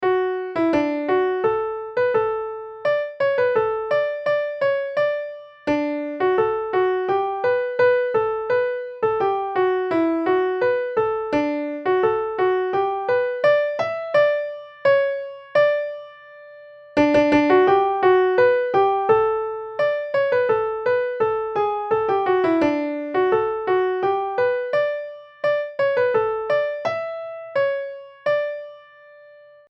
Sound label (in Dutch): vivat piano